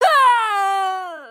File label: k o scream; mk9; sonya blade